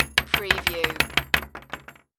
Bullet Shell Drop Machine Gun Multiple Hollow Wood